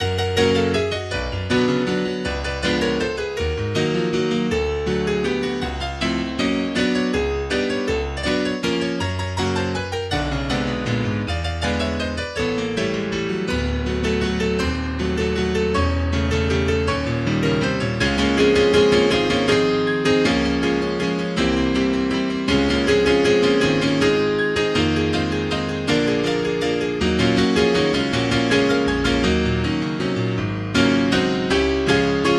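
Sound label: Waltz, MIDI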